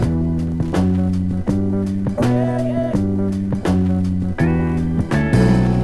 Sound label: guitar, Phonky Loops